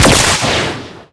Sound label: SFX Shoot; Skylanders Cloud Patrol; Arcade, iOS, Mobile; Ninjini